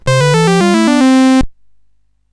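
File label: Number Tumbler, MOS 8580 SID chip music, games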